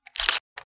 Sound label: Shutter Click